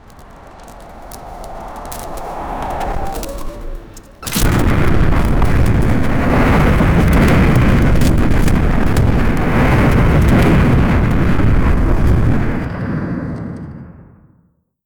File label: create a sounds, conflict